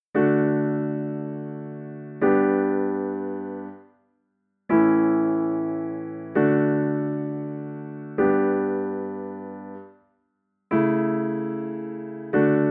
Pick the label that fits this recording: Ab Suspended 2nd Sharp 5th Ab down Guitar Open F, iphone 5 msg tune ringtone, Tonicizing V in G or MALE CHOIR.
Tonicizing V in G